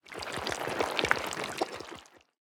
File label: step; smite; poseidon